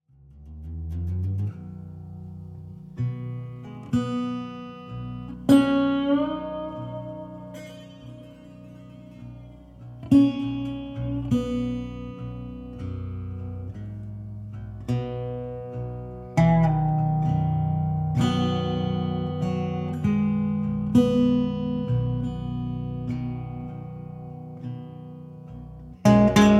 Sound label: Roots Rock